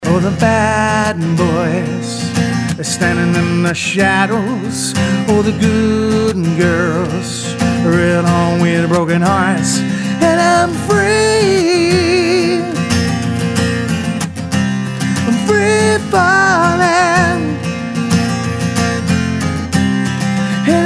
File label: Solo Mix of Older Songs